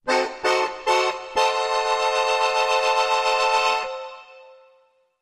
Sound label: Accordion Suspenseful Bridge